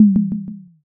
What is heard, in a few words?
tsuzumi